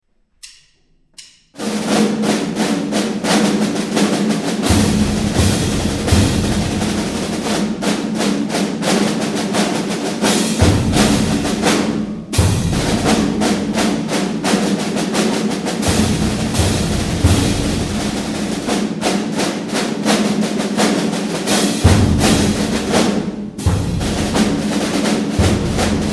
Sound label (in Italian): percussioni